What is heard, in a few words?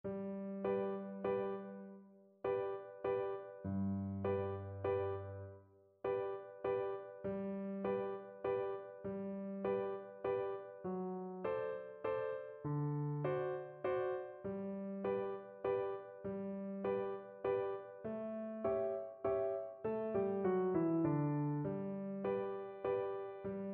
elephant, Recorder